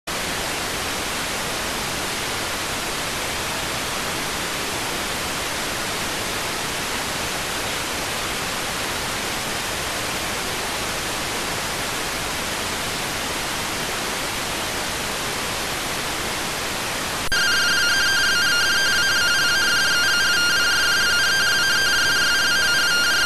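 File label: radioteletype receive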